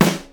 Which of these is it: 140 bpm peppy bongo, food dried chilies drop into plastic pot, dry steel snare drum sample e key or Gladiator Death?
dry steel snare drum sample e key